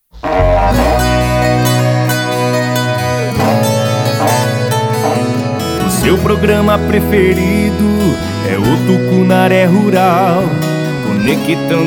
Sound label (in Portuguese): Jingle Programa Rural; Tucunare